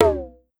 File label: Conga Hi Down Slow, Pitch Env; Clavia DDrum-AT; Pitch Env Various